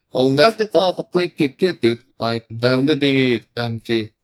main melody sound

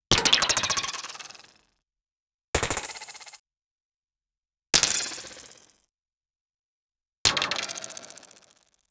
magic mana particles slow

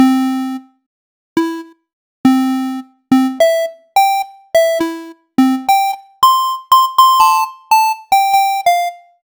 short catchy jingle, branding

